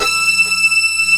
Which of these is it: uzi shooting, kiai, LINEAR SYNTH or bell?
LINEAR SYNTH